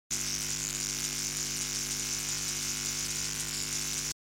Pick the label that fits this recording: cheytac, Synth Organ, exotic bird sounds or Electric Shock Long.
Electric Shock Long